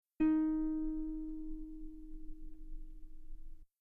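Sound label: guitar D sharp 4 very long piano normal; bananenkeyboard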